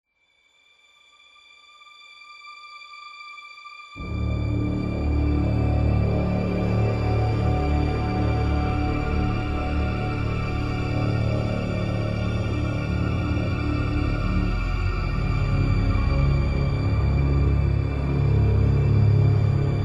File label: Loop Tense